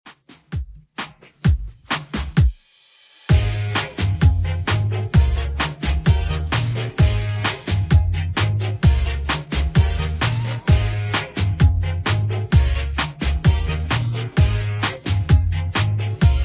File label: 2ks Redrum 130bpm